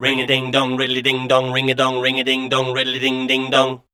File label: SCAT C, Voice